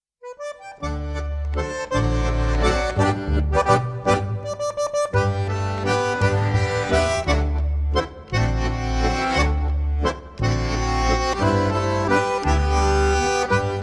Hochzeitstanz Walzer

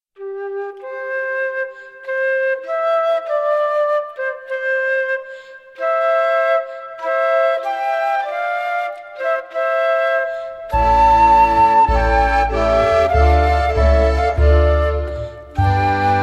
Kleine Fantasie Volksmusikgruppe

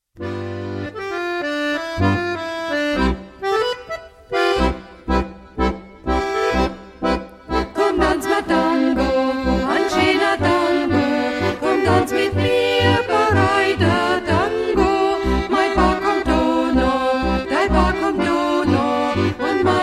Tango

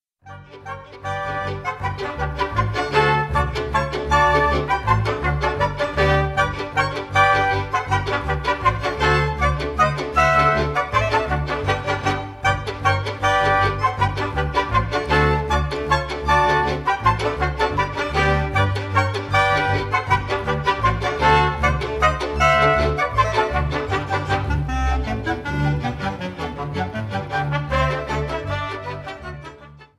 Galopp

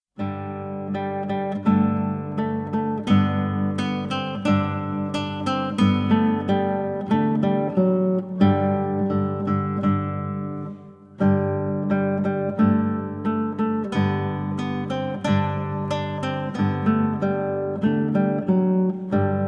Hirtenweise

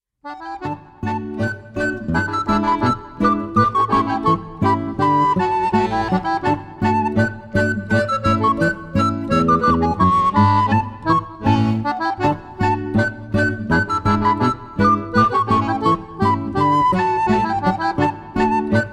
Rheinlaender

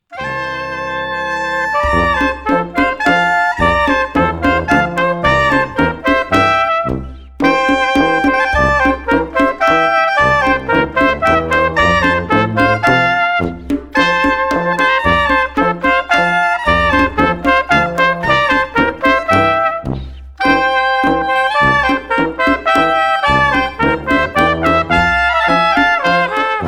Freies Fränkisches Bierorchester

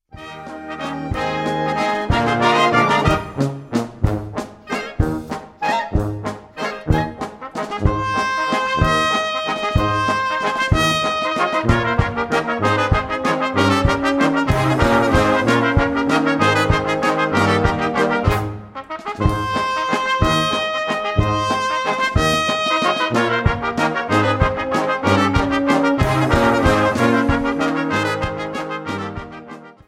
Ländler

